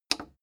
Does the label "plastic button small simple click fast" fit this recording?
Yes